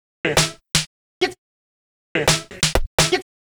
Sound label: Vox percussive show; drums pads